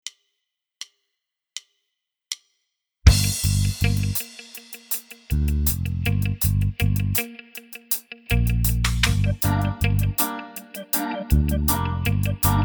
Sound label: drum reggae